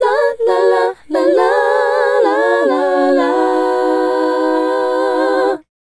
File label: Female Group, Vocal